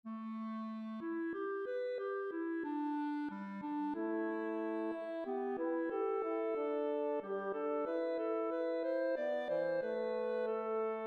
Woodwind Quintet; Antiguan

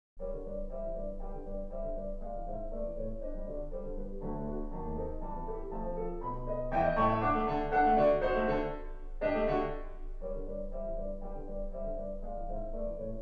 Humoreske (G)

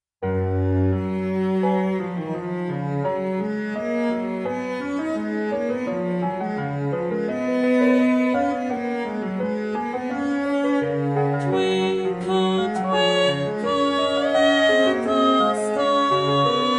Bicinium Twinkle Twinkle Little Star 415hz

